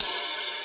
Ride, Dubstep One-Shot Drums

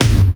tom, One-Shot Drums

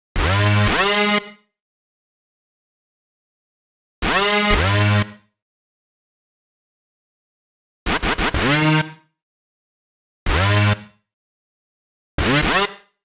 125 G#m Lead Synths; Complex Electro Synths; Prime Loops; Transport

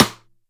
Rimshot, Dubstep One-Shot Drums; Rimshots & Toms

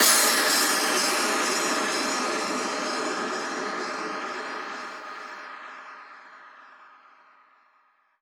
FX Impact

